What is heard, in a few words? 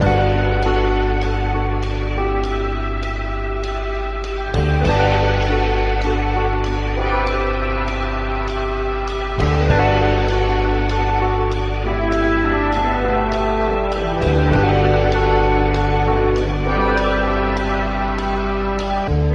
strings, Loops; Phonky